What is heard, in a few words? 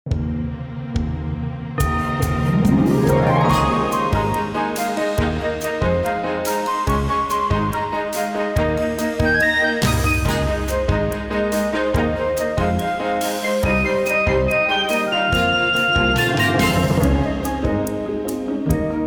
TRAILER MUSIC